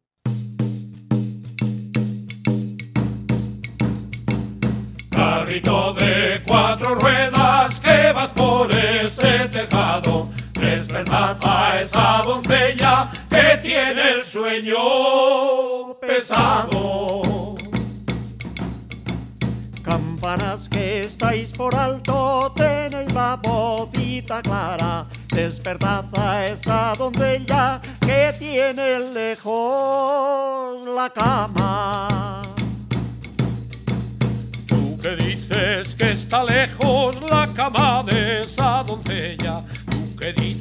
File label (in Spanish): ronda carrito de cuatro ruedas